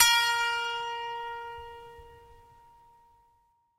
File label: koto; Summer Catchers